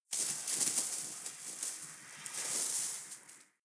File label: snow trickle medium